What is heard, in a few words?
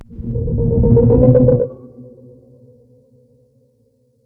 MOLOCH attack